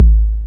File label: Kick, techno drum